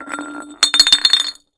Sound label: Glass Bottle Roll On; bottles